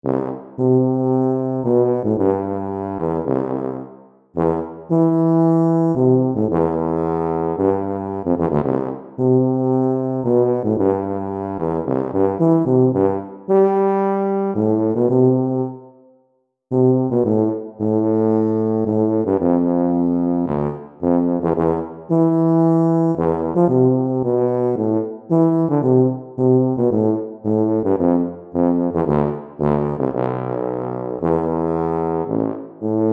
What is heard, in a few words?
tuba audition technical